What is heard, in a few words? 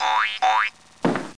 spring; McDonaldland - Silly Games; McDonald's; snakes